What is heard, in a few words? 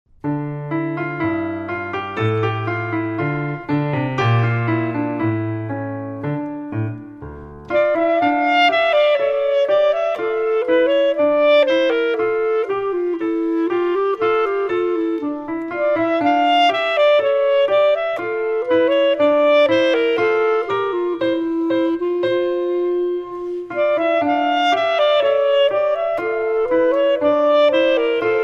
Bourree, sax